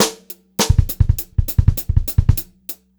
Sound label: FILL, Funk-Groove Drumloops; USB Soundscan vol.38; AKAI